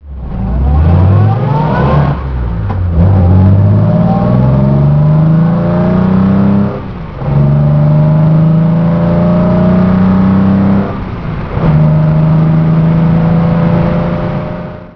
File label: etype